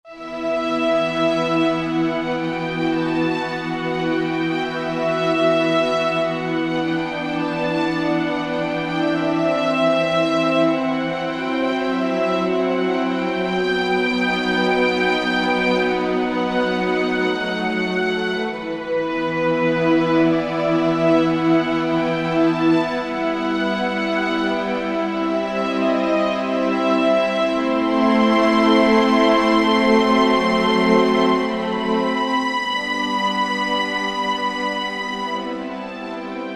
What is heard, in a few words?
Str Orch p-f, Roland SR-JV80-16 Orchestral II